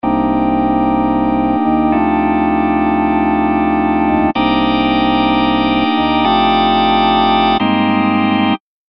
B3 Narrow

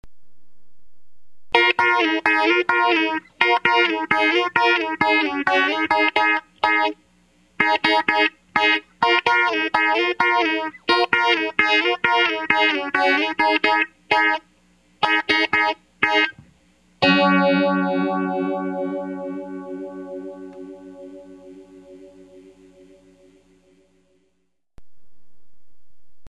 Small Stone

